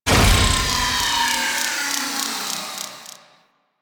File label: Crewship Weapon Recharge; Vehicles; Crew Ships; Weapons; Warframe; Rail Jack; Corpus Crew Ship